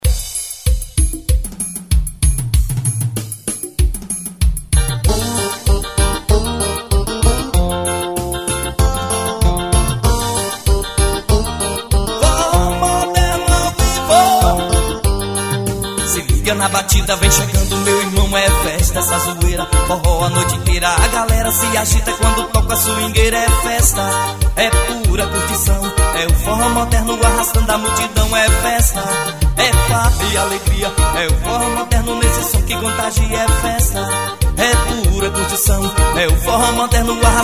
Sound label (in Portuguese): forro moderno farra alegria